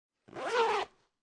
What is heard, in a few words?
Zipper Zipping Bag Close, Foley, Zipper, Zipping Bag Close; Zippers